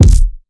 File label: focused steel kick drum sound e key; kick drum samples